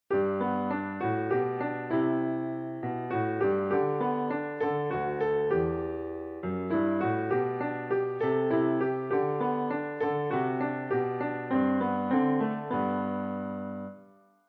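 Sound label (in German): Tiefe Stimme; Stille Nacht heilige Nacht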